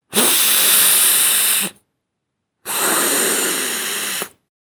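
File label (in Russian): звук вдыхания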